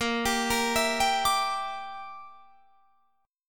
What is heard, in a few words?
Bb 6th Flat 5th Bb, Guitar Drop D